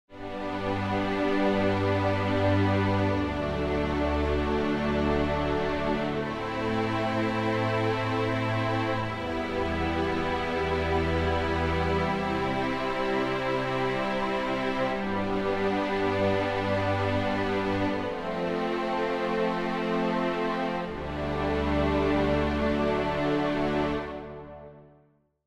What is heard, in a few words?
String Chords Better Voicing